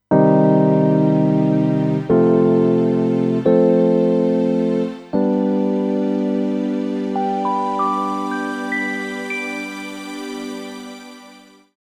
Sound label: Epiano Strings; Phoenix